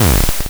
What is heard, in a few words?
impact; DungeonsOfKharadum; wizard; animevox